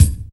Kick, Percussion Hits; Zero-G Jungle Warfare 1, 2 & 3; Drum Loop Hits; Cha Hits

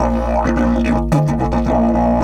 108, Didgeridoo

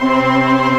Organ, Barton Morton Full

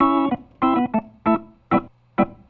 Organ, 70s Analog Funk

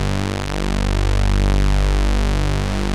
MULTI SYNTH

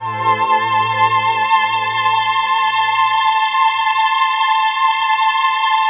PAD, Dance Mega Synth

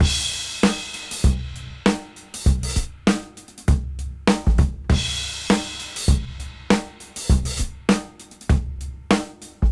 Swing 98 BPM; AMG; Keith LeBlanc Phat Butt Jams

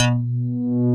PPG 2.3; DIGITAL1 HYB

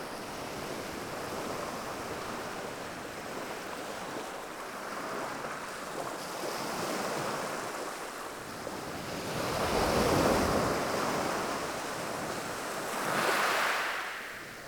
Water Surf Ocean Wave On Rocks

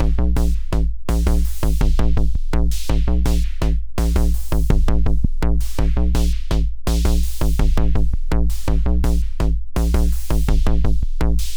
Synthetix, Processed Percussion

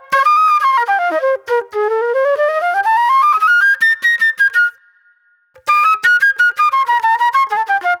Fast Funk Flute; Sonic Foundry (Sony Creative Software); Manhattan Flutes; The Funky Mama

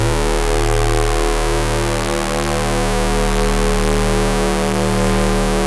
SAW, Synth and Organ

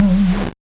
Noise; musicradar; 8-bit bonanza

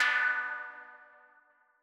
Mono DBeat B3; KG MegaSaur

